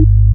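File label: bass organ; E Lab; XTc Files of House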